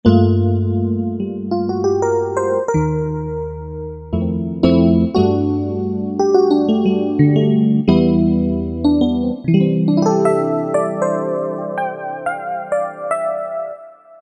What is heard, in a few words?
E.Piano 3, Korg M1; Factory preset examples